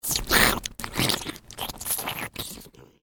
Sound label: Aliens Eating; Alien Sound Effects; science fiction sound effect; star wars / trek style games; Andrew Fleming